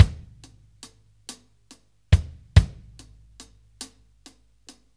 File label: 6/8 Loop, Drum Tools, Laid Back